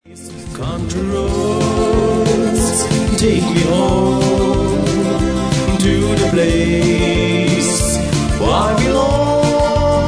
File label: Country